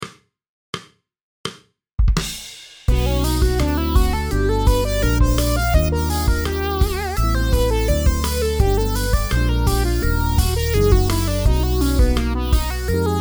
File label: Funky Solo, piano